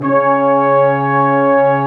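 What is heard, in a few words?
brass section, large ensemble long; Roland LCDP06 Brass Sections; Lrg.Ensemble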